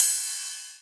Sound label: Rides, Cymbals